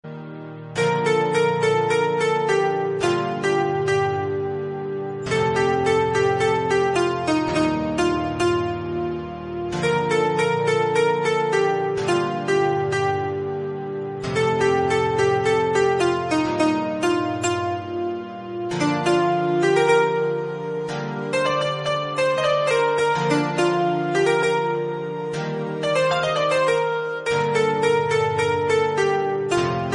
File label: instrumental ringtone